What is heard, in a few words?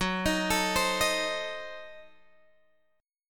Gb Diminished 7th, Guitar, Drop D; chordshape; down